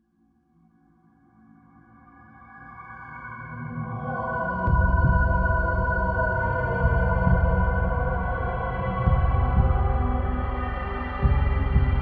Drone Horror Musical Tonal Scary Ominous With Heartbeat; Scary Soundscapes (Sound Effects:Horror)